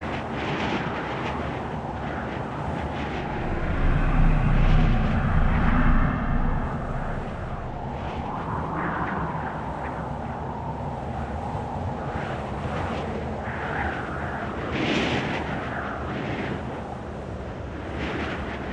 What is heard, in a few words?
amb aftermath